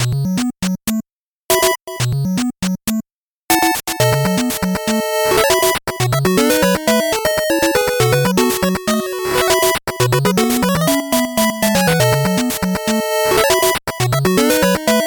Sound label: 8bit bgm slow